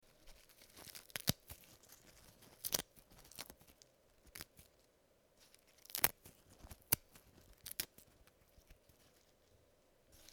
tearing mint leaves

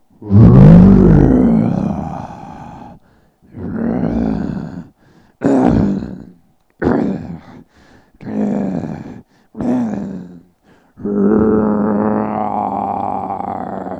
growls

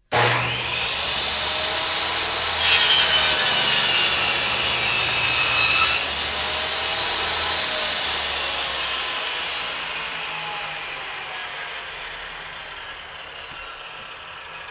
table saw

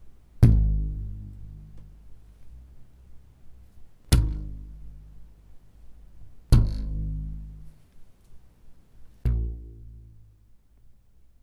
loose bow string

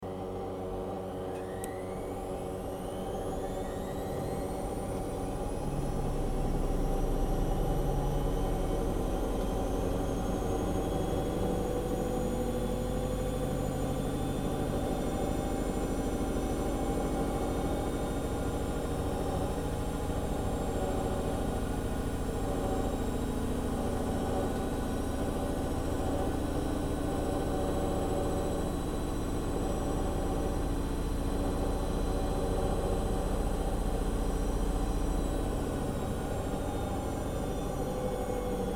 washing machine last spin

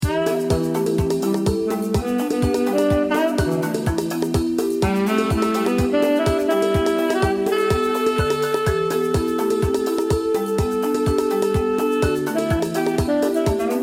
Sax; hummer; ubuntu

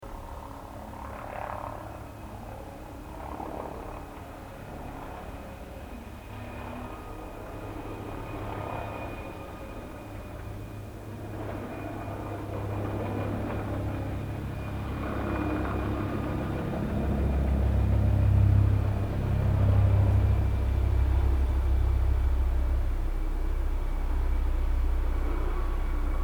helicopter

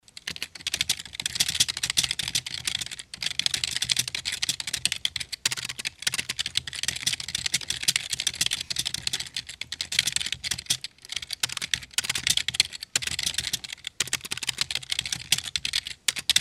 typing fast

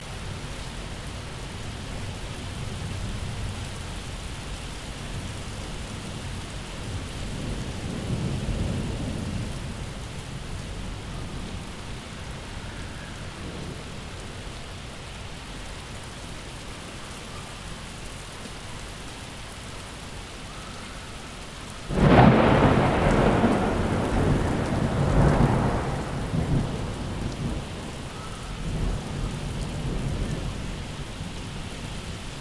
complete summer storm with thunder

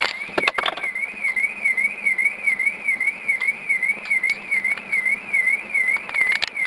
siren

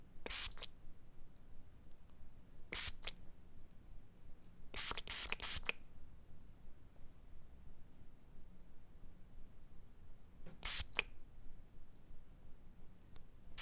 pump spray

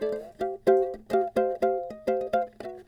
LOOP Ukulele Stereo

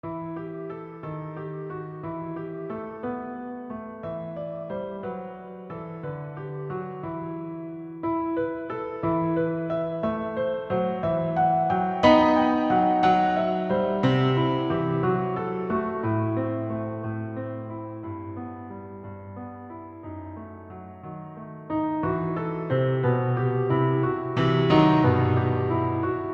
Premiere Etude Pour Piano; A Edwige

